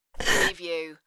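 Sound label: Female Gasp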